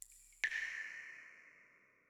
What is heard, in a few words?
sewer drip; garrysmod